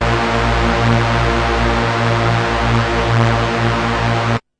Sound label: bass A sharp 1; multisampled; must destroy you bass 1